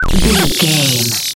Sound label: retro boost power